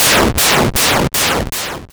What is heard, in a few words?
death; gimm-platformer-project